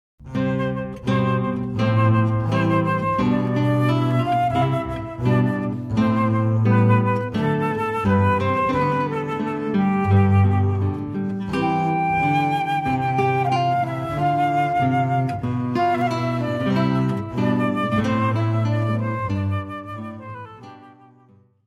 Aria, guitar flute bass trio

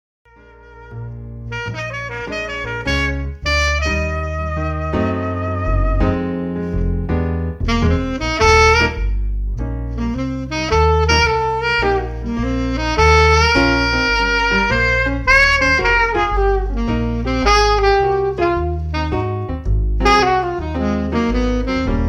Keyboard and S; Keyboard and Sax Jazz; Lyrica Demo

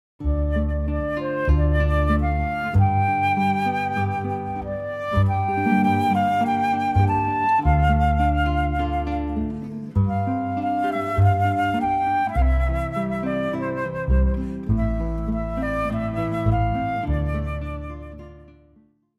guitar flute bass trio

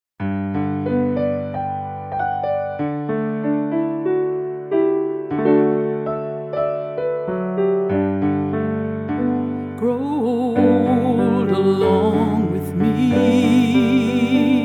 Vocalist; Lyrica Demo

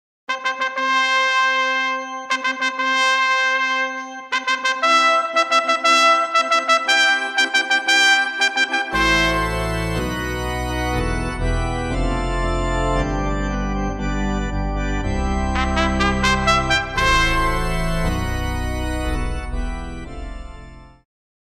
Wedding March (with organ), Trumpet; trumpet piano organ harp; Lyrica Demo

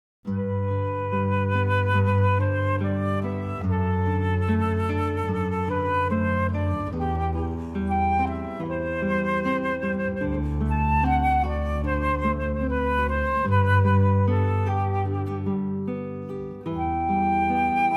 Arioso, guitar flute bass trio

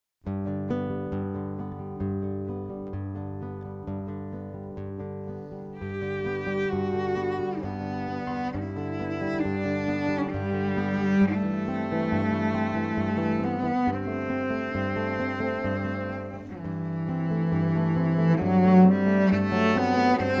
Trio of Guitar, Violin; guitar violin cello; The Swan

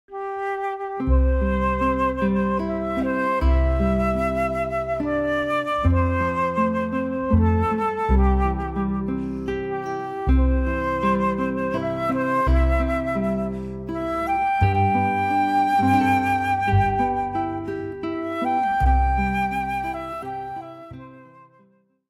Amazing Grace, guitar flute bass trio

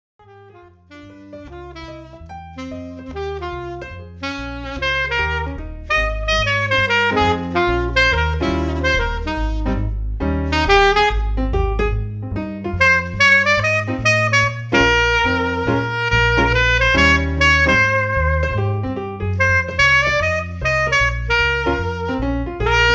Keyboard; Keyboard sax jazz

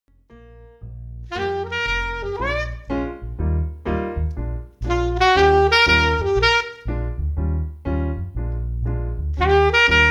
Keyboard and Sa; Keyboard and Sax Jazz; Lyrica Demo

